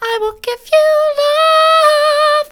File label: i will give you love soft, vocal